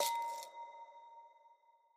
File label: winter menu subtle